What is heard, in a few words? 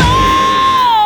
Stabs